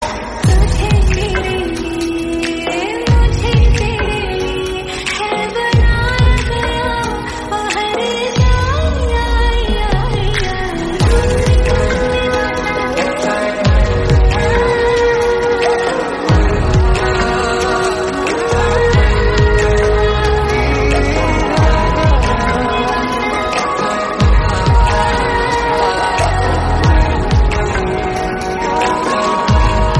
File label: Slowed Hindi Ringtone